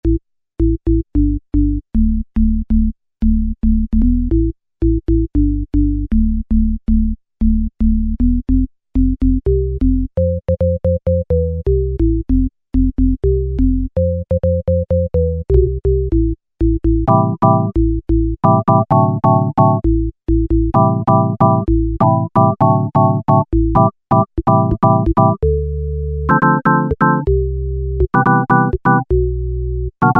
Organ Bass, Korg microKORG; Factory Voices

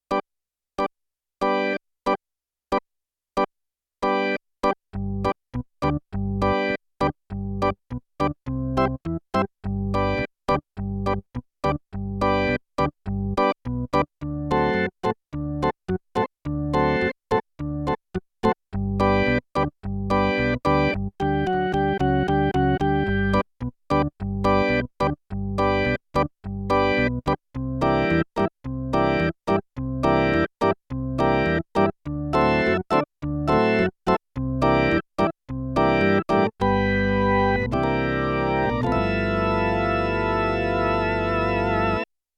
E Organ 2, Yamaha TX16W; Combo Performance 2

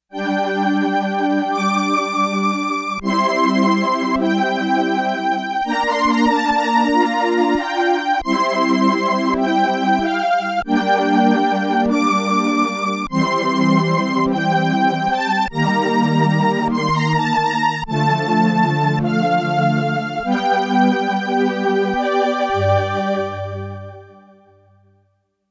Rich Str

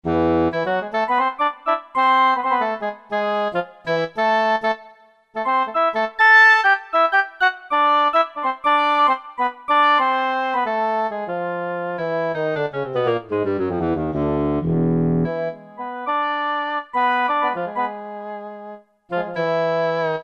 Wind Ensmb; Factory Preset examples

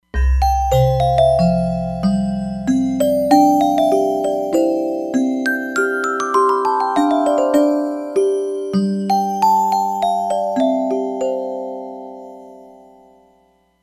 Music Bells, Roland Fantom XR

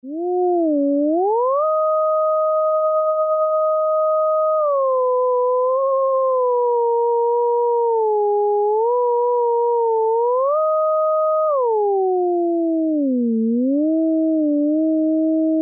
Air-amin, Alesis airSynth

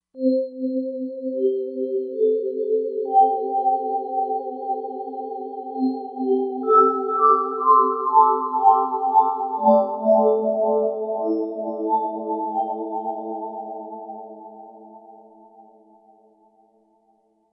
Magic Wave, Roland Fantom XR

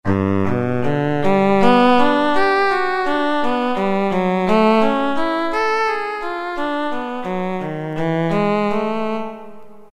Cello, Roland MT-32

